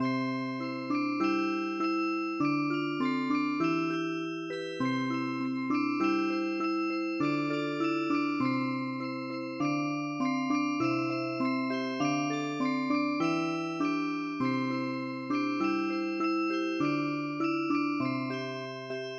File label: Music Box, traditional; The Breeze